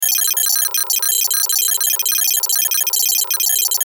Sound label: DATA STREAM DIGITAL, Digital, Feedback, Glitch; Production Element, Imaging Element, Accent, Transition; Multimedia; Blastwave